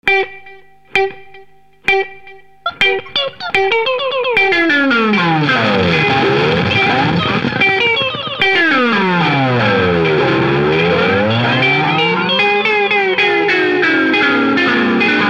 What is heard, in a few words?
MODULATION DELAY